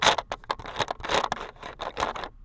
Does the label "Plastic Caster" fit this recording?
Yes